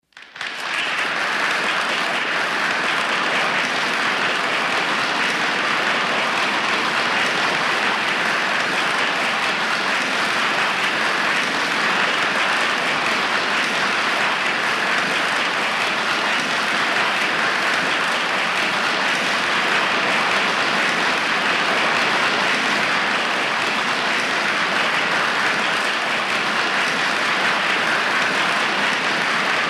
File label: 200 People Applaud; medium